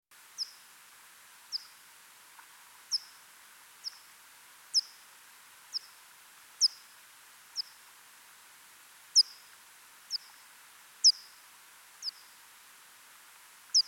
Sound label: yuma myotis